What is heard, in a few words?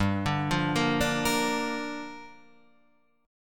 G Minor 7th; Gm7; arpeggiated; 3,5,3,3,3,6